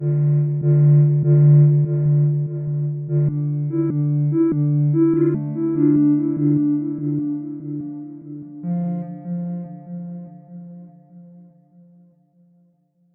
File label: Ambient Glitched Synth